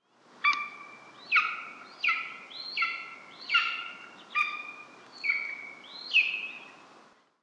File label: Golden Eagle Aquila chrysaetos; Gairloch